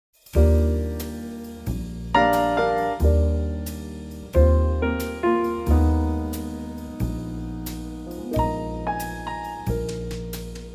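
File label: Eb, Backing Tracks for Male Vocals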